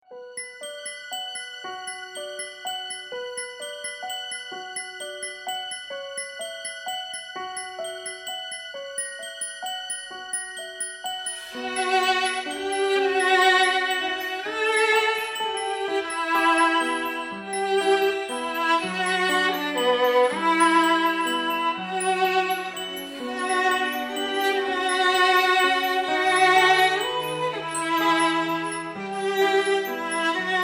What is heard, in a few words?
violin; Anastasia